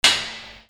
BANGS

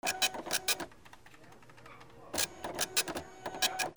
OFFICE

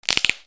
BREAKING

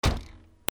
CARS

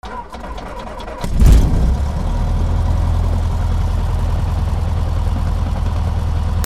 flight

